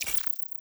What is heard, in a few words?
ui collection